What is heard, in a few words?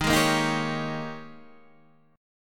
G sharp Major 7th Suspended 2nd Suspended 4th